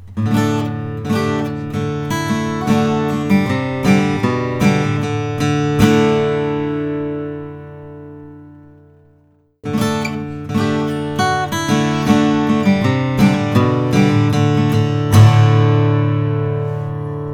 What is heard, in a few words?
Martin custom slope shoulder 00 14 natural